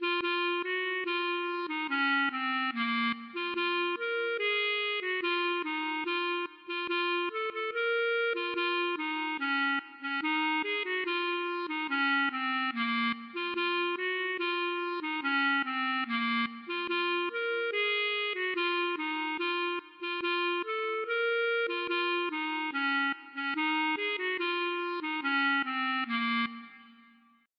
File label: klezmer